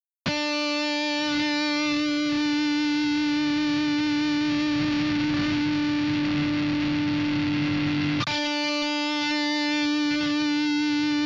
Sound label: Amp Room Solo; Softube Amp Room Bundle